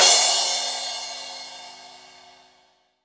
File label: crash; East Collexion; Drum 1 Dry